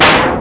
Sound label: blast; The Atari Compendium (Toad Computers) (1994); music